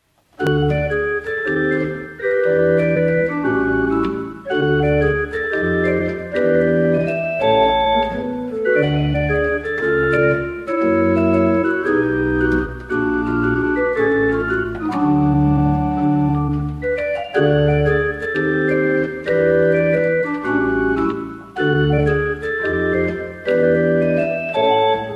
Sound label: Jazz Pop